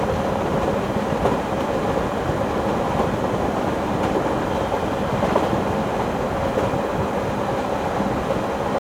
train int

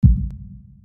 heart stop

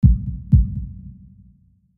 heart single slow

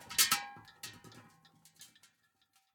can clatter

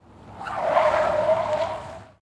skid

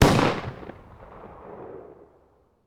start pistol

